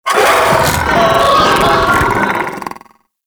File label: Vocal Attack; Warframe; Sentients; Ortholyst